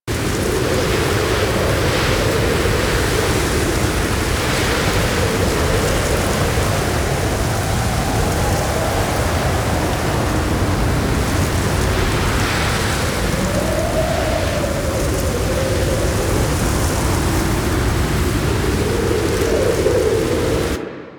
Sandstorm Loop; Warframe; Mummy

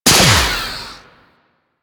Mech, Weapons; Strun Prime

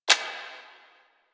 UI Menu Scroll; Warframe; Caliber Chicks minigame

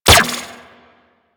Laser Fire; Riot Moa

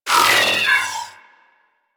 Ship Data Scan Stop; Ambience; Props; Warframe; Corpus Ship Remaster

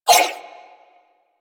Nunchaku Prime Whoosh, Weapons; Warframe; Tenno

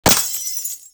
Glass Shotgun Projectile Hit; Warframe; Tenno; Weapons

